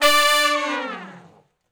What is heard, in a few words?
Long Falloff (D) unison, Horncraft; Sections